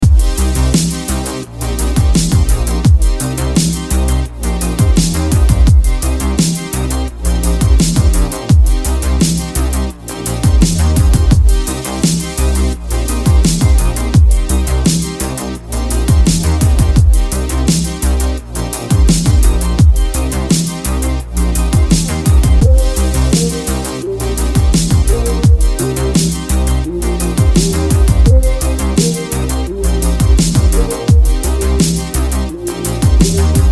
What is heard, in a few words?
retro synthwave chillwave